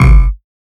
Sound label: Buzz Basement; FREE SFX PACK VOL 2